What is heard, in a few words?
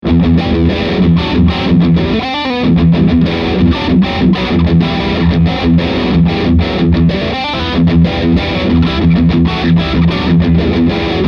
Scoop Metal Riff